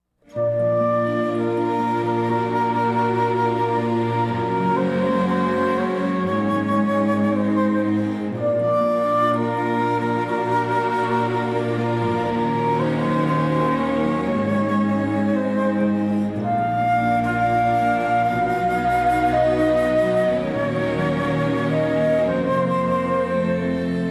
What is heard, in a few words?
Melody